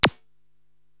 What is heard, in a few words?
Zap, Korg TR-Rack drum machines